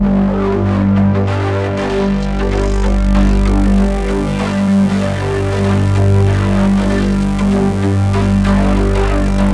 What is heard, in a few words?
MORPHUZZ, Synthy Tonal; Distored Reality